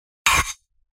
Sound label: UI X axis